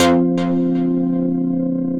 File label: Festival Organ Drop; Synth Keys